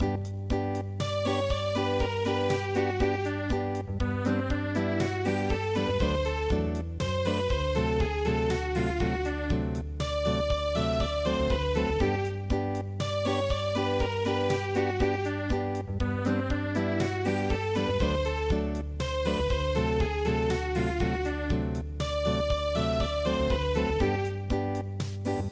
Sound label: Mexican hat dance Acoustic